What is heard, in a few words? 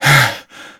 breathing better; Call of Duty Modern Warfare